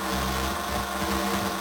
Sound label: Democracy Fire Loop; Warframe; Caliber Chicks Minigame; Weapons